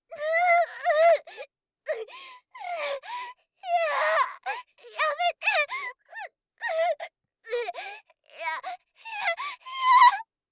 抵抗; 効果音なし

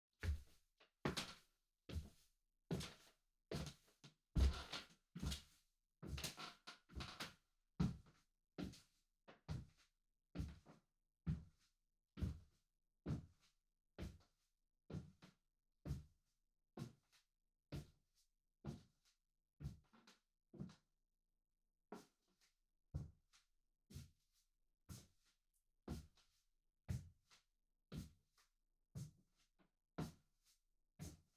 行ったり来たり 低速, 足音, スリッパ; 3DIO

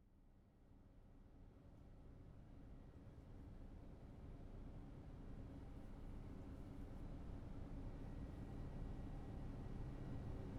ASMR お耳のパックと、耳かきと・右耳（ピールオフパック、耳へのクリーム塗布、耳かき、パック剥がしぴりぴり)

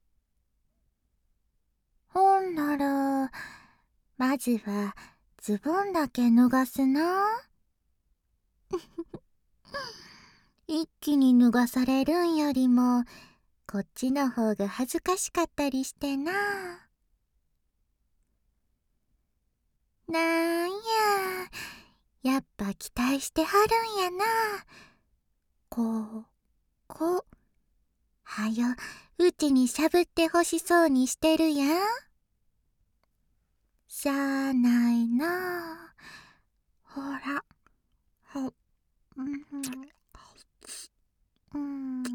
焦らしと耳元でくちゅ音

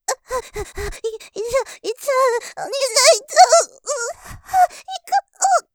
喘ぎ 吐息マシマシで イッちゃう！！; おかずにスパイス２; 勢いマシマシ

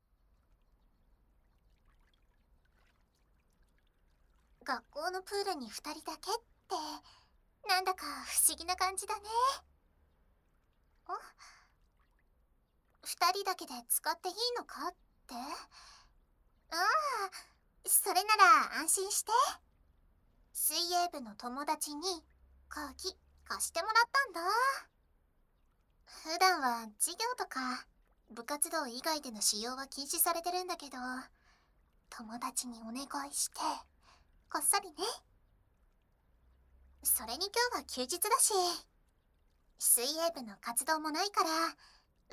スク水で耳舐め, ASMR; この展開はそろそろ…！？

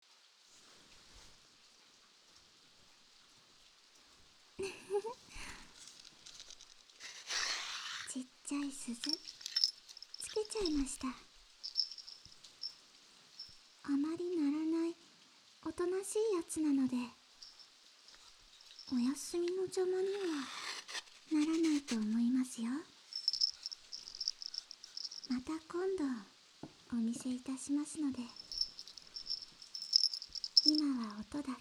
耳かき(左); 昼

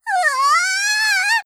うわああああ！, ショタ

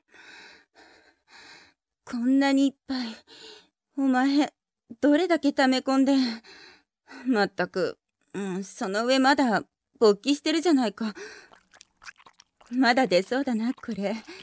H音水音のみ

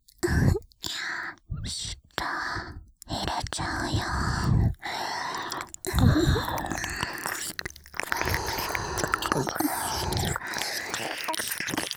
両耳舐め; 密室射精ゲーム～指示に背けば首輪が爆発～

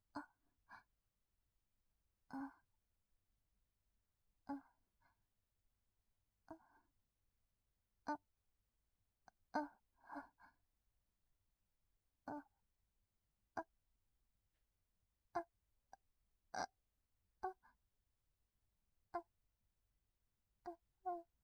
あ、あ、あ、あ、

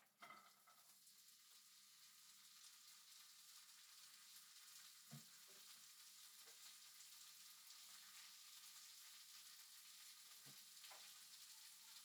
炒める 弱, 食事に関する音; KU100

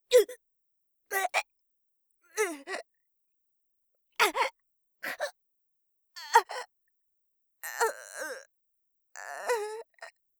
お腹を抱える; 効果音なし

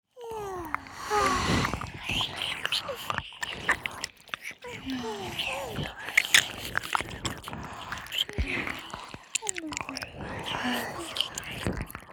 耳舐め 4人分合成

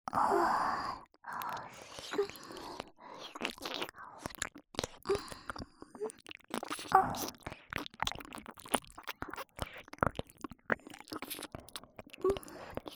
浅い耳舐め（反転）

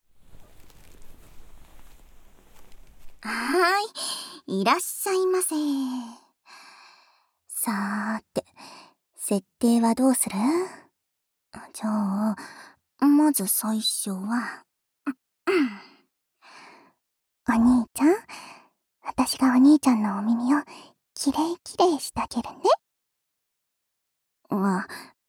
癒しのスク耳かき・右; えろごろ

